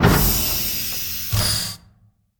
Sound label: Tiny Door, Ice Caves; Level3